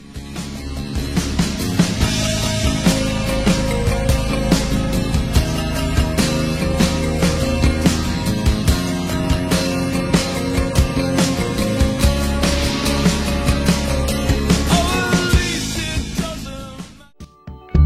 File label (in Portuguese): Progressao I V vi IV